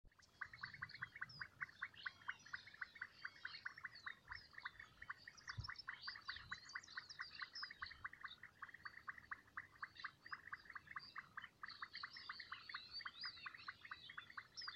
gracilis, Leptodactylus gracilis

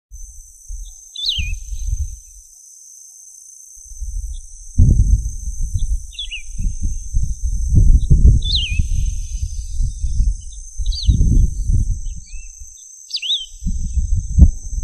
Emberizoides herbicola; Camino a Santa Maria

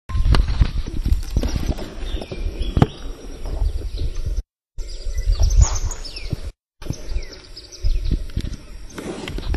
Chaetura meridionalis; PP Urugua-i; Jorge La Grotteria

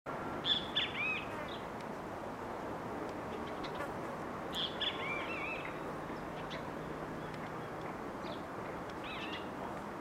Pitangus sulphuratus

